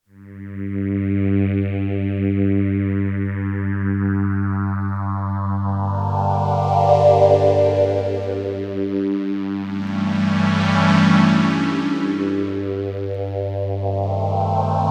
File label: phaser test small stone